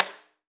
AFRO.TAMB, AFRO.TAMBORA; AKAI S-Series CD-ROM Sound Library VOL-5

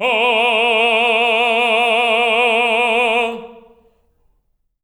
TENOR A#3, Voices; Spectrasonics; Symphony of Voices

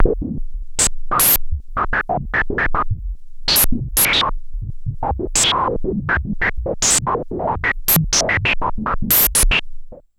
Pulse, Filtered Noise

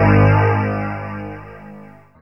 bass Low Growl; Sonic Foundry (Sony Creative Software); Ugly Remnants VOL-2; Basstuff

